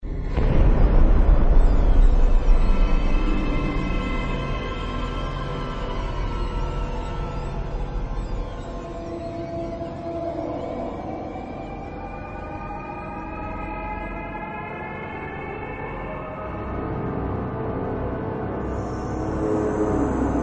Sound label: Dungeon